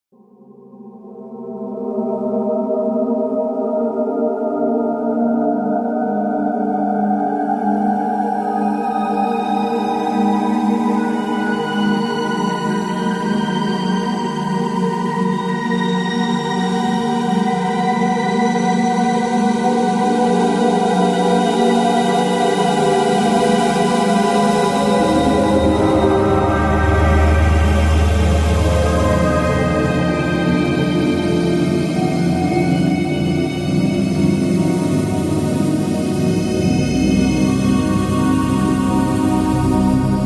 deep ambient

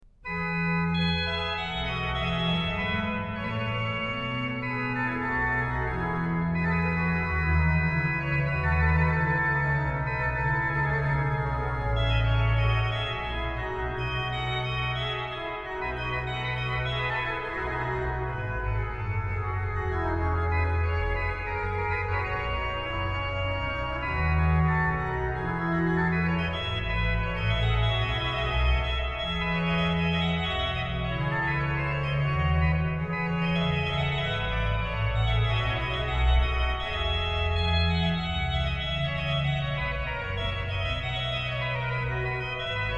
Schmucke Dich, o liebe Seele, organ; BWVAnh-II - 74; BACH; BACH ORGAN WORKS; CHORALE PRELUDES

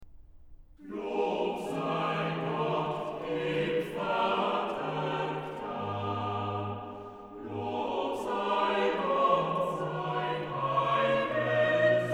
Chorale, ORGAN; BWV0062; Lob sei Gott, dem Vater g'than; BACH; BACH ORGAN WORKS; CHORALE PRELUDES

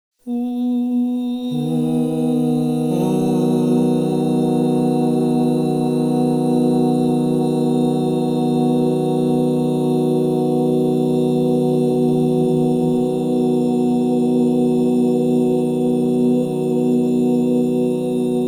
Si major, crystal bowls; Yoga and sound

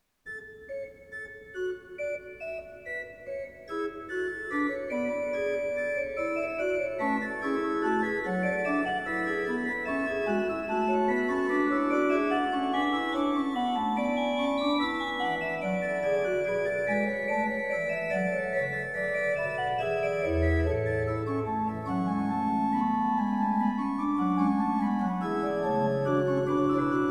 Fughetta, organ; BWV 677; Allein Gott in der Höh sei Ehr; Orgelmesse; BACH ORGAN; Organ Works; CHORAL PRELUDES

